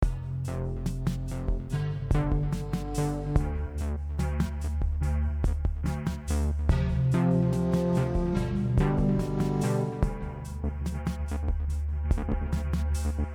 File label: chorus